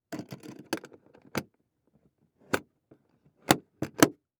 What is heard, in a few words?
Industry Tool Box Close Lid